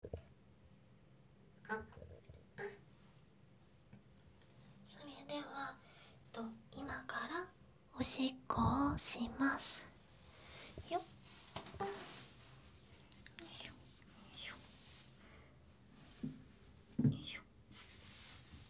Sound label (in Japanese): おしっこ